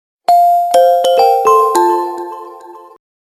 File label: reminder chime